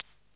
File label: MARACAS; Drums; audiomulch; simplekit